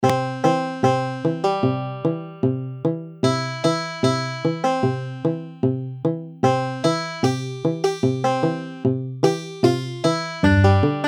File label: Folkpicking; Oh My Darling Clementine